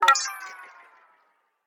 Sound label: low battery; Smartisan OS 6.0.0